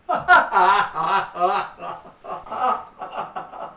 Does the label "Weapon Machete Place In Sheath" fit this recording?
No